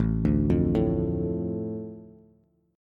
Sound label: A Suspended 4th Sharp 5th, A, Bass; Asus4#5 arpeggiated; JGuitar